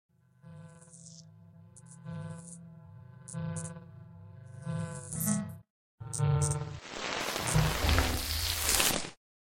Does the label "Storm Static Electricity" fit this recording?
Yes